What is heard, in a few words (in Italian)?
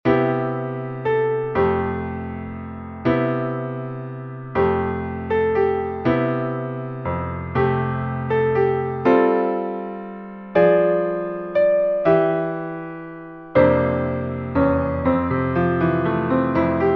violino Piano; astro del ciel